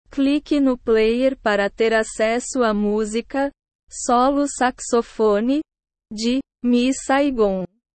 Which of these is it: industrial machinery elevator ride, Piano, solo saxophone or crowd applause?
solo saxophone